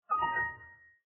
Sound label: Windows XP Print complete